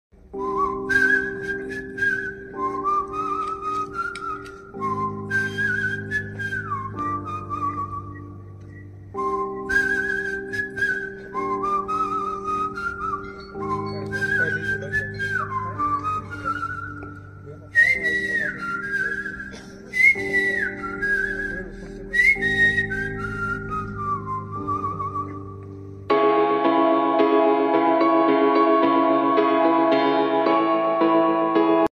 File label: animal movie whistle bgms; animal father song bgm